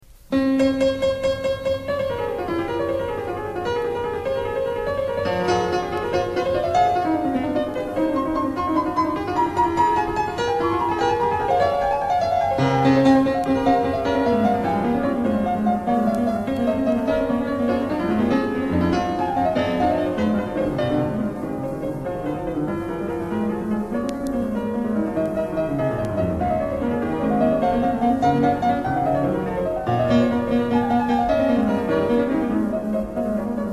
fugue en Fm; Mendelssohn; romantisme

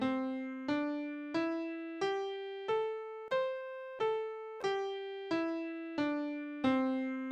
pentatonique Majeure

